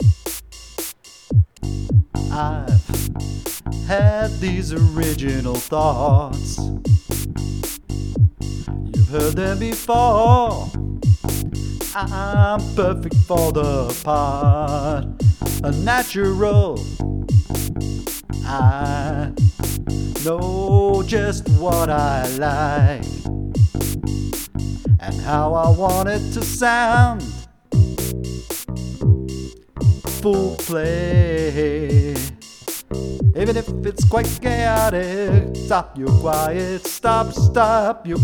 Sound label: drum programmed